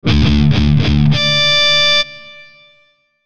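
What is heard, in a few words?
Musical Element, Drum Snare March; Blastwave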